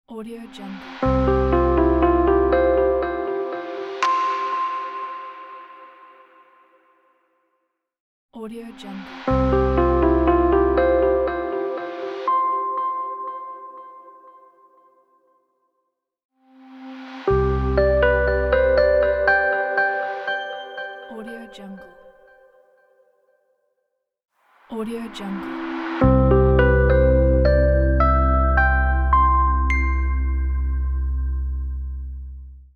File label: modern sting logo pack